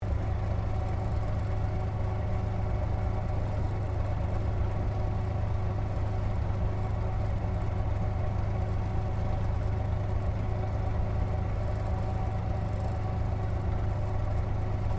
Aircraft P-40 Kittyhawk 1940s idle Interior, WW2 Kittyhawk or Warhawk fighter plane; Airplanes